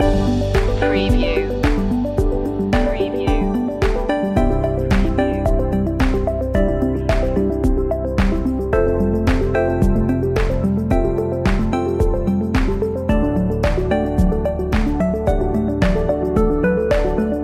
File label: corporate dreams loop